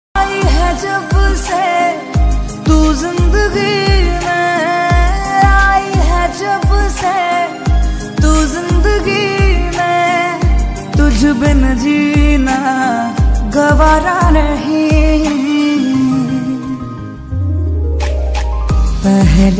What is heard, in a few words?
Romantic